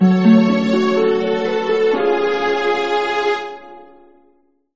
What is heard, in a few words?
Gameover; Mercenaries Saga 2; Android